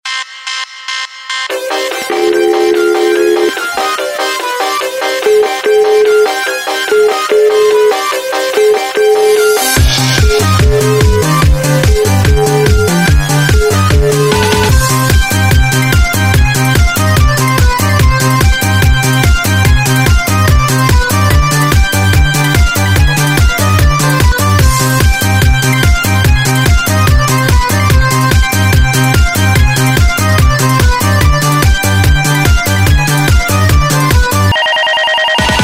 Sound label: instrumental